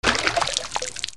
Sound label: WATER